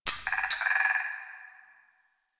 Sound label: Tree Critters Scared; Ambience; Props; Warframe; Duviri